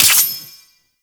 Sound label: Claws Extend; Warframe; Tenno